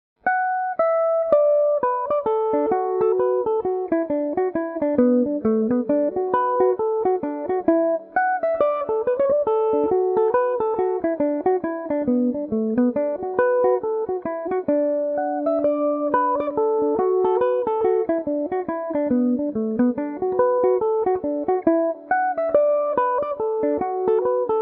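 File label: D major, Irish mandolin; kittys wedding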